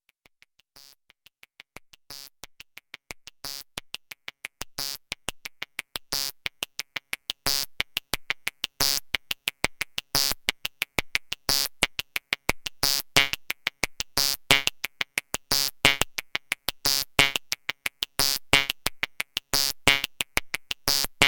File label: VCD Frequen Steiner